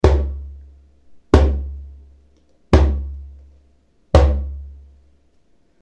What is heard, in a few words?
Djembe Bass